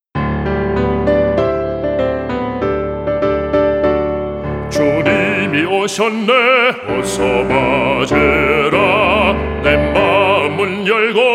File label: BASS